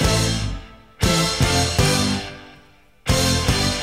horns, Loops, Phonky

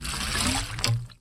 whistle up chute

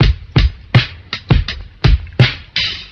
Drum loops, Boombap

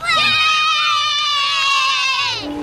"yay", kids

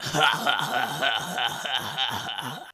laugh demon

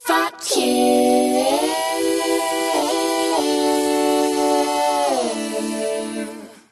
"fuck" SUNG, expletives

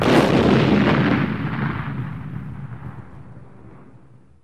explosion; Crash Bang Boom

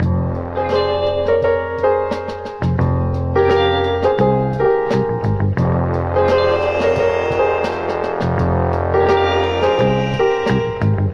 piano horns, Loops Sad

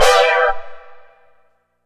brassy, Synths; Notes

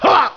"ha", male

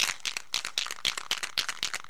spray can, drum loops

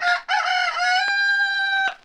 chicken rooster crow; Animals

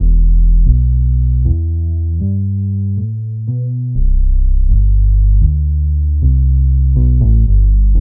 bass, Loops

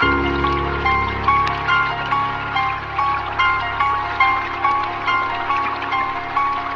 piano and keys strange funk; Loops; Sad

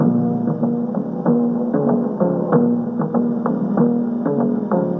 guitar

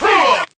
"huh", voice; ooh ahh

